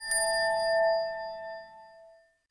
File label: Crystal Wine Glass; Samsung; Galaxy C7